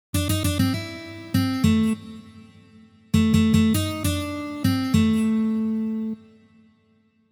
Blyuzovye liki i uprazhneniya

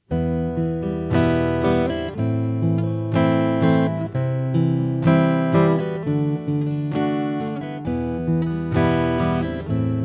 Boj s pereborom

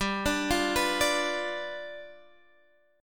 Perebor G7

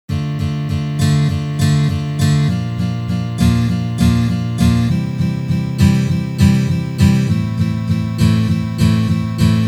boj

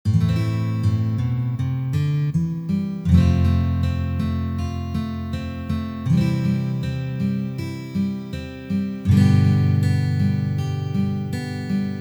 perebor na gitare variant dlya kupleta

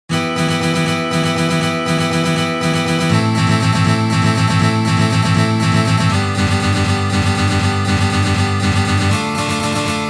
CHechenskij boj na gitare

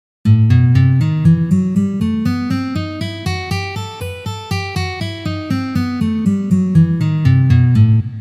Gamma Mi minor garmonicheskij vid pozitsiya

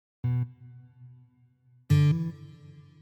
Dopolnitelnye basovye partii

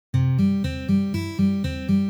Lomanye patterny

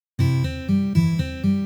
Nishodyashhie patterny

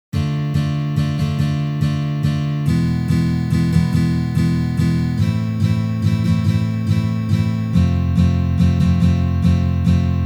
Valsovyj boj na gitare

